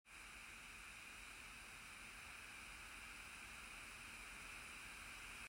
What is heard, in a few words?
rapid cooling fan